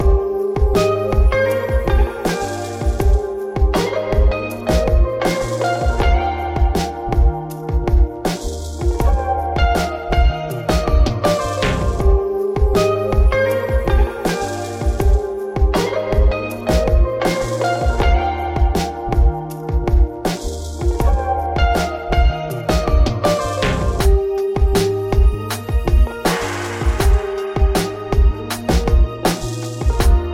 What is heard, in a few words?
romantic happy rain